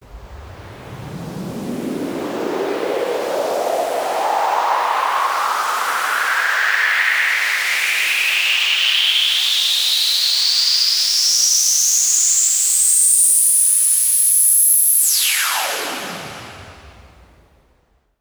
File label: Sweeps Long